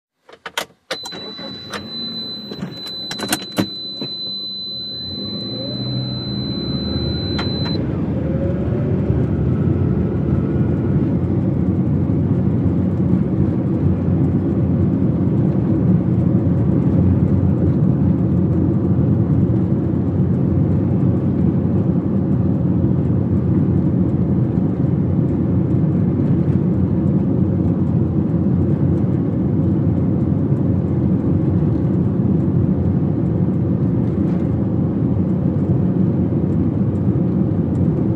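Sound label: Small Car Engine Accel Interior, compact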